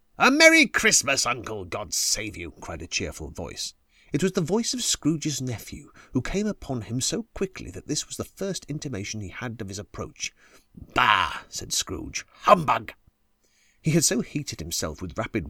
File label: christmas carol extract